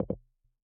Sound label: null selection; ChromeOS Sounds